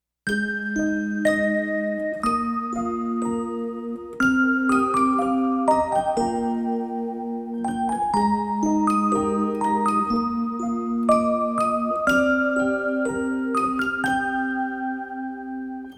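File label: Beyond Fate (Music Box); stellar blade soundtrack; Orgel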